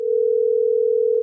outbound ringtone; blink-qt

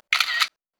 screenshot; blink-qt

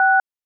dtmf 6 tone; sipclients

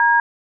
dtmf D tone; sipclients

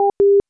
hangup tone; blink-qt

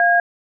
dtmf A tone; sipclients

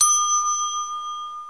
file received; sipclients

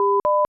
beeping ringtone; blink-qt

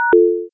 zrtp securemode; blink-cocoa

